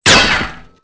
cable; Soldier Of Fortune II Double Helix; XBOX

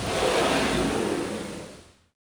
slide move, slide door; movers; doors; Soldier Of Fortune II Double Helix; XBOX

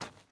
fs dirt, footsteps

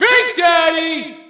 Shout, audience; UFC Tapout 2

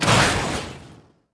crash; Star Wars Obi Wan; XBOX

